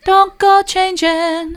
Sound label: don't go changin soft, vocal